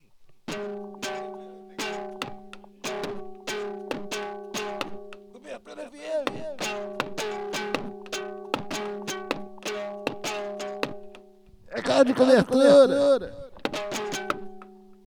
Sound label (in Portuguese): vinheta